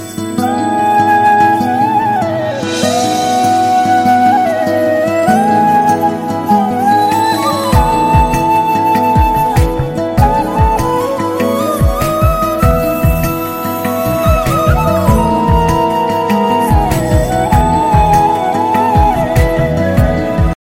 soft flute

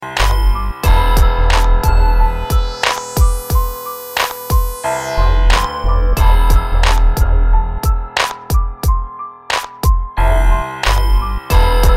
Music

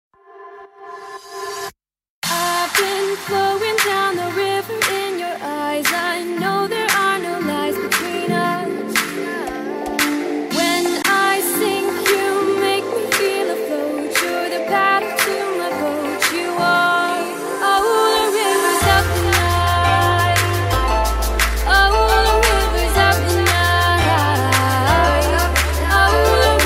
Music

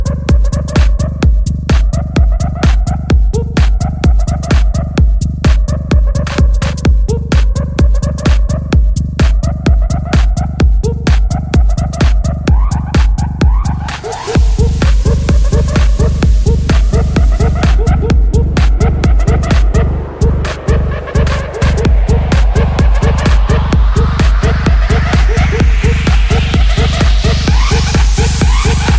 TECHNO MIX

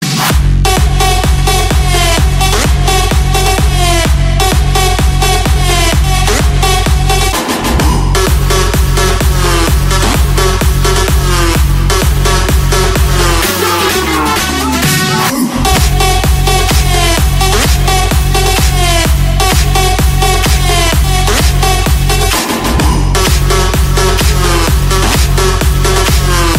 hard electro

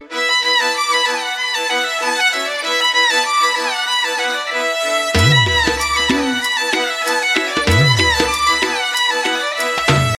Violin

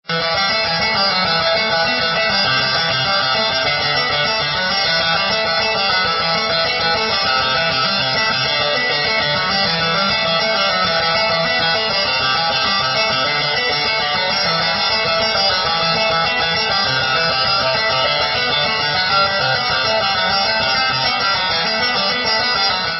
piano vs guitar solo

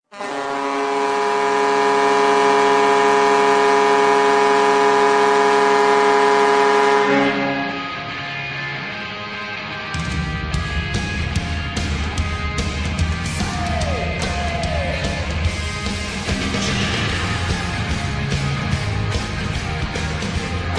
goal horn; vancouver canucks 2013

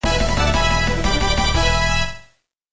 Lvl Up, ringtones; Golden Sun

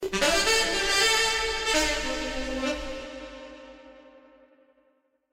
Sax message tone

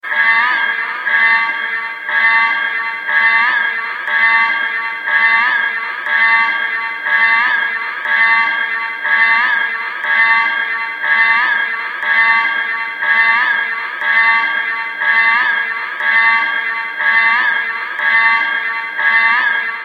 warning siren

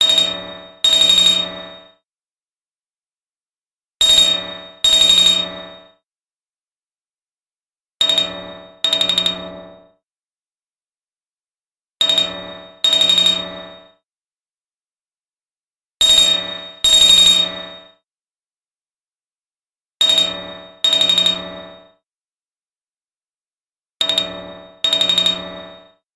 High pitch

